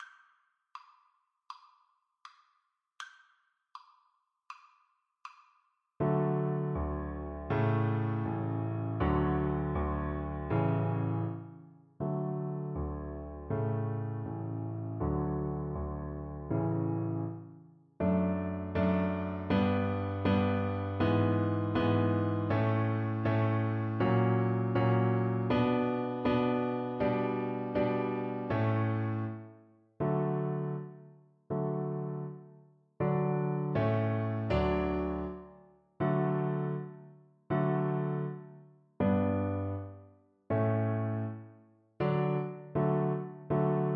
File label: cello